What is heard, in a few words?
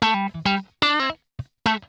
PLUK, Funk Guitar; 90 s Sample CDs; Zero G